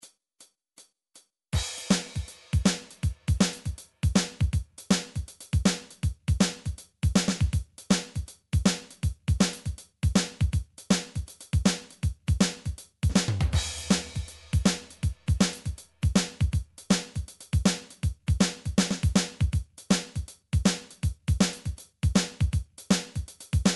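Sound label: shuffle; song book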